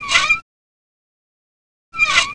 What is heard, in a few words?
door